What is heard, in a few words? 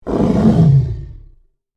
Monster Groan, Horror, Monster, Groan, Creature